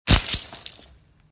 Mäppchen fällt runter; Kategorie: Alltagsgeräusche; Federmappe Stift; Grundschule Bad Kötzting

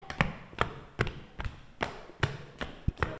schritte

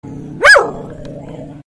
helles bellen

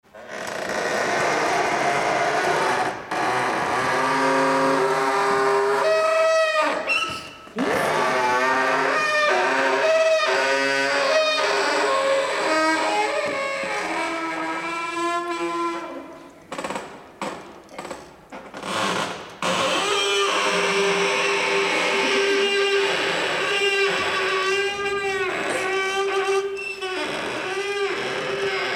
quietschende-tuer

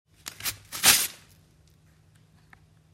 Blatt schnell; Kategorie: Alltagsgeräusche; Blatt Papier; Grundschule Bad Kötzting

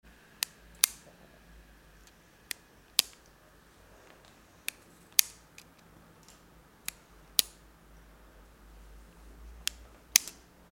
kugelschreiber

